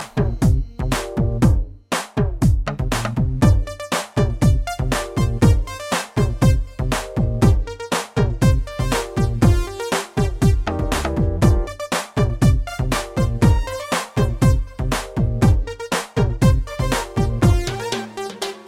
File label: Nice Music